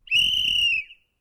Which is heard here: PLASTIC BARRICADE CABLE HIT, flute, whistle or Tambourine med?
whistle